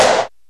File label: phat steel snare drum sound f key; Duration 0:01; Samplerate 25000 Hz; Channels Mono; snare drum samples